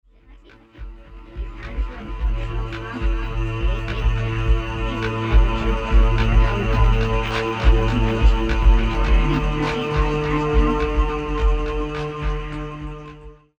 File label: Pipe Choir - Atmospheres